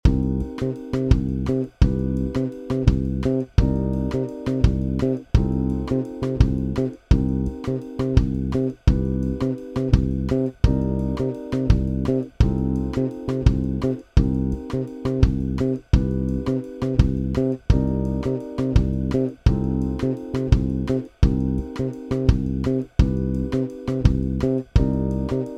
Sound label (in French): boucles